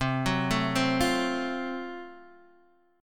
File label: Gb Minor Major 7th Flat 5th, chord